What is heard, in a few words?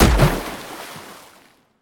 projectile splash; Gladestock Studios